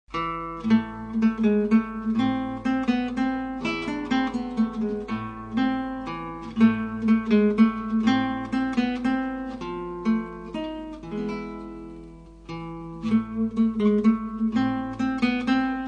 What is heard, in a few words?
Menuet